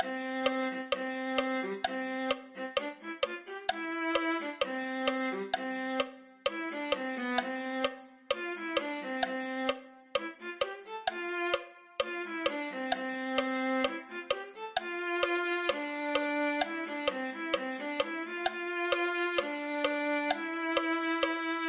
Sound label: viola